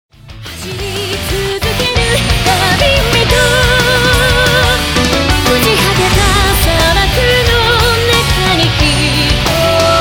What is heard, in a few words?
Pop Rock